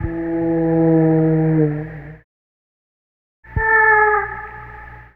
Vocal FX; Sonic Foundry; Sony Creative Software; Stylus Pressure Urban Grooves on Digital Wax; What Up

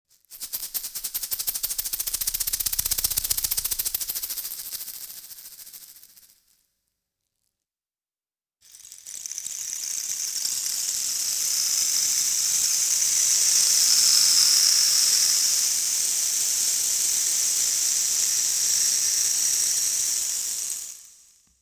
Didgeridoo And Overtone; Good Stuff Audio

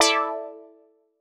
Quart Stab E; PowerFX - Nu Jazz House

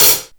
HH mid op, big beat; Big Beat Samples & More; AKAI; HHMIDFX KIT

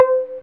Viola Pizz C5, Fairlight CMI IIe Pizz Str

